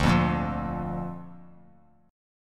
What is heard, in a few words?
D Minor Sharp 5th D down; Dm#5 strummed; JGuitar